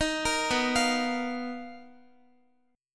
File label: B Major Sharp 11th, Guitar, 4F,4A,5C,5G,5C,6E; arpeggiated